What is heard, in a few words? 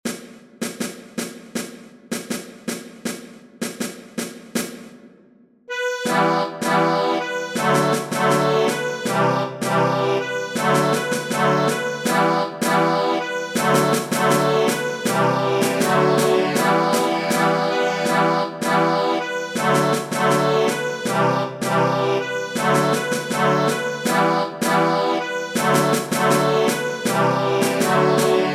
drum